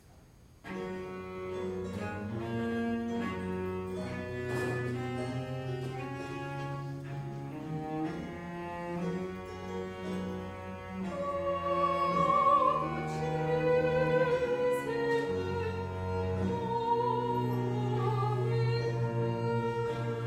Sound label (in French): Choeurs de femmes O Dulcissime; Lully; Plessis Pate